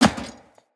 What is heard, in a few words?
Heavy Grunt Boot Walk Metal, Footsteps; Sinecure